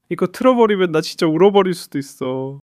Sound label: crying